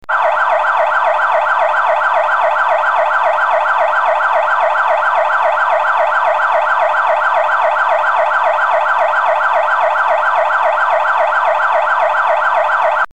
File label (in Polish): Sygnał Policyjny; pojazdy z sygnalem